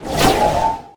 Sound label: Pickup Scifi